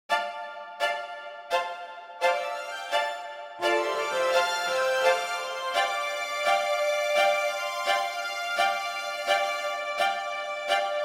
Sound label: Strings AMS RMX 16 Ambience; Audio Ease Altiverb XL plug-in is a convolution reverb